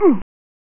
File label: Champion Wounded, Amiga; Dungeon Master and Chaos Strikes Back